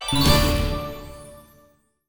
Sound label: re spin count up loop end; netent